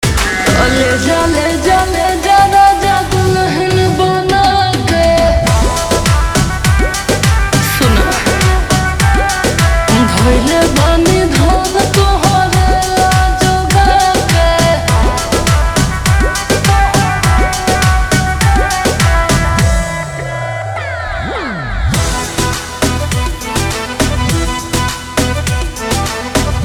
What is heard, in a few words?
Ringtone Slowed Reverb